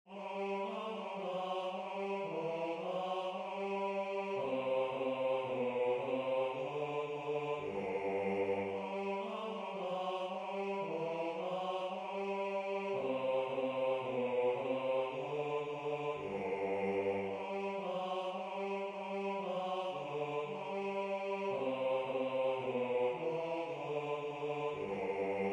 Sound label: Bass